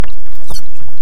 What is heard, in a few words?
Inia short call